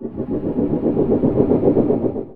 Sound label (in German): helikopter; geraeusche